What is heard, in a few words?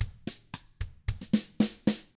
COUNTRY, Drums; 111COUNTRY